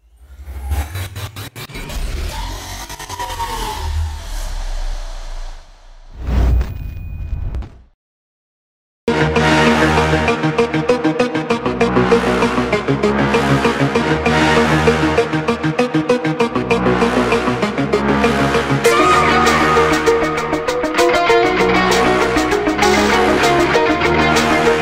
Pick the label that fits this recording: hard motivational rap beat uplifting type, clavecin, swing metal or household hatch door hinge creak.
hard motivational rap beat uplifting type